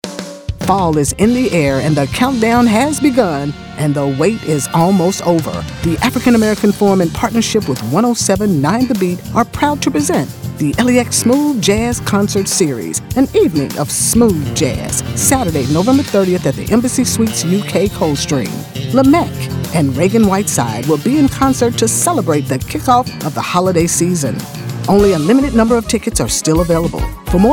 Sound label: Smooth Jazz; Sponsors